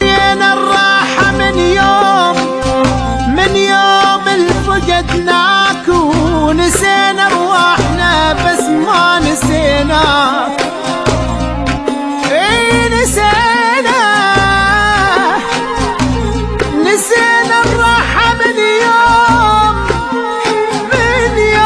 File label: maval arabi; arabi ghamgin